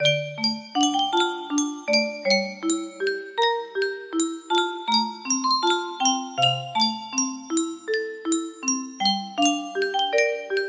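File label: music box melody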